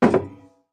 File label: metal